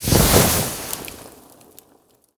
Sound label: Torch Flare Up; Goons Torch